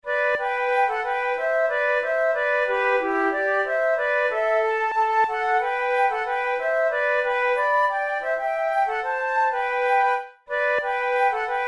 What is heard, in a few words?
march duet, flute tunes; march in Macbeth